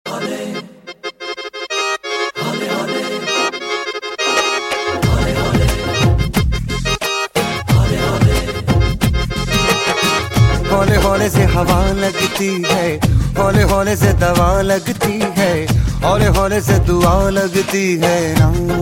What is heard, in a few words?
funny instrumental ringtone